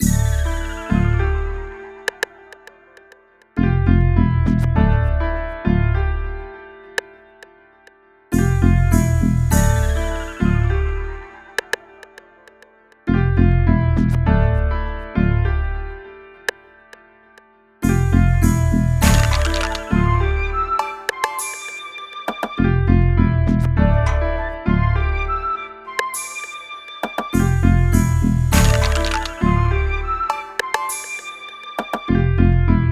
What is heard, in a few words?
101 BPM D Min, Hip Hop Melodies, West Coast; Blue Faces